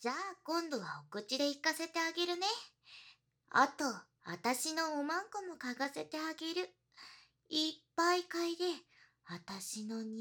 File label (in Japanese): フェラ, ASMR; 顔面騎乗